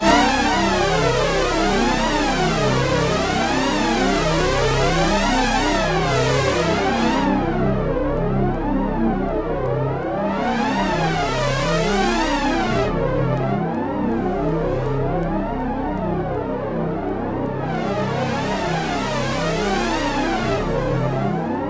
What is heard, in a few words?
C1, Synth Line; 90 s Sample CDs; NiceBeats; SynthLine VOL-20